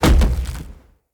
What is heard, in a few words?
Stag Charge Impact; Powers